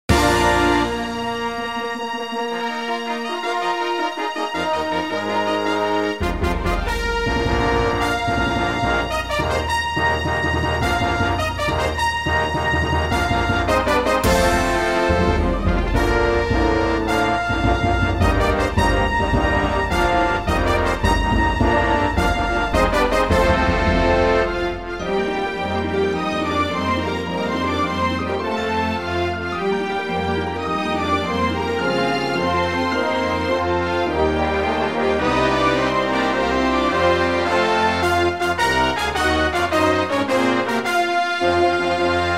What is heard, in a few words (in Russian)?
Фанф; StarWars ЗвезднВойн